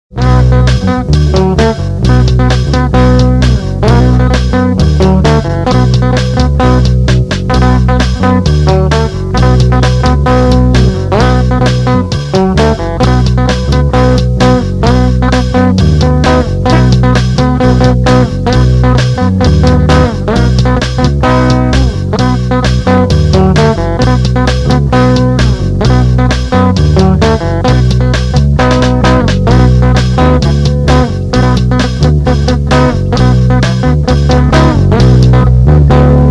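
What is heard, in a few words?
instrumental, 1990s indie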